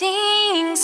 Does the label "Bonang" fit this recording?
No